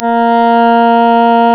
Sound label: Synclav FM, WIND, A#; Big Fish Audio